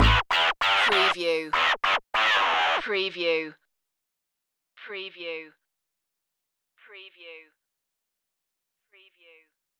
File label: loop ending